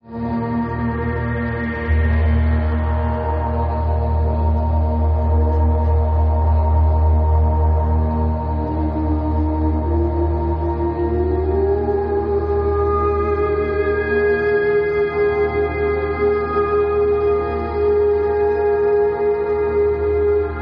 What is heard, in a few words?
AMBIENT EXPERIMENTAL